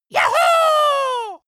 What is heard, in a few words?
dwarf cheer "juhu"